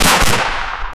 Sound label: gunshot from assault rifl